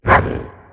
bark; smite; scylla

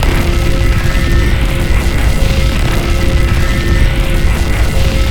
beam active; smite; god atlas

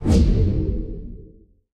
Arcane End; smite; god merlin

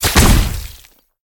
explode; smite; ahpuch skin10

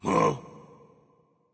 confused; smite; thor

